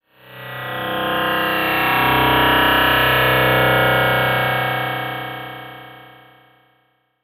Production Element Title Transition Data Transmission